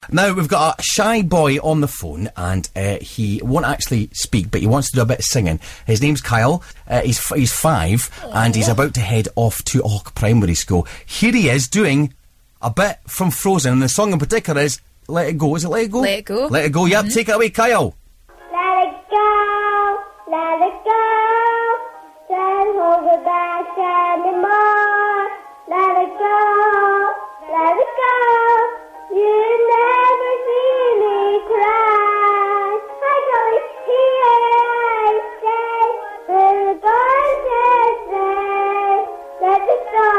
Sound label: singing on air